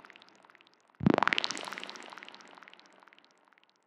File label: One Shot Galaxy Squelch